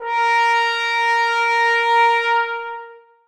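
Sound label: trombones sustain a#3; Sonatina Symphonic Orchestra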